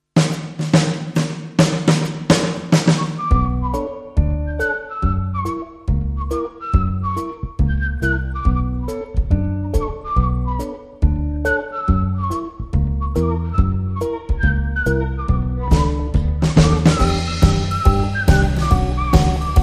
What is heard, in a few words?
Instrumental